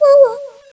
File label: scene appear; cstrike; Teddy CSO